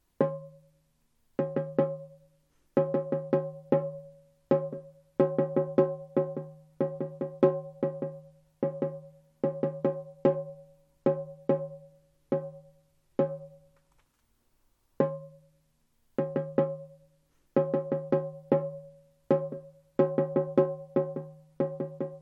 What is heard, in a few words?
Drum Pattern